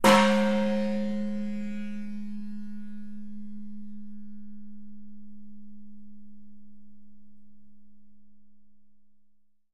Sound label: Khmer Temple Bell, bell towers